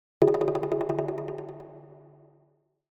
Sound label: Jungle Drums In The Middle Of The Jungle - Slow Down Thin Hits; Hollywood Edge; sound designer's toolkit v2